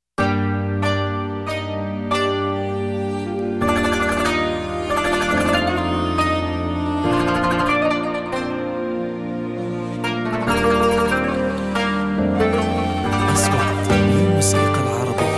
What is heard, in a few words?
events